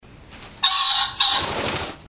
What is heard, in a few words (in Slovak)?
bazant obycajny